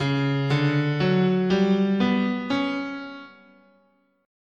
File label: C sharp Iwato, scale